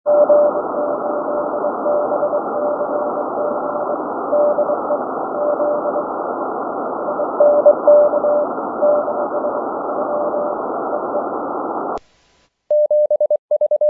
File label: cw